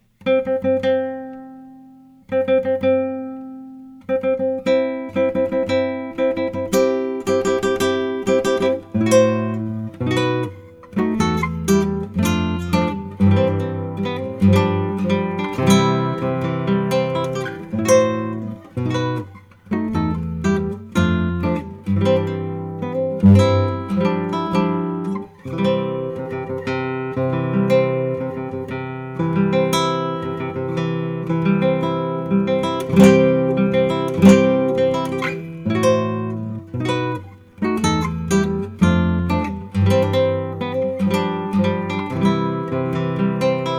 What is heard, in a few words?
wedding march